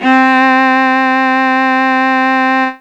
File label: violin